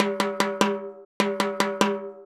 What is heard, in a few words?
Timbali, Latin Percussion